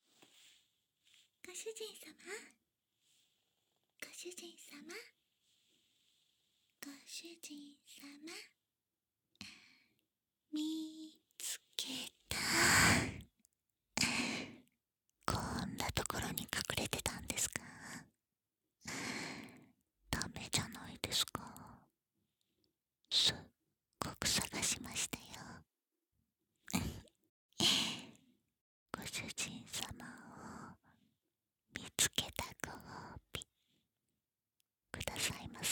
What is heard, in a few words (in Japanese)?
ヤンデレメイドさんの耳舐め; ヤンデレメイドはご主人様を離さない